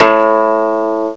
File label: KOTO; GUITARS; M8; CMI Factory